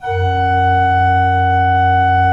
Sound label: SWL F1, Cathedral Organ, SWELL WERK; Propeller Island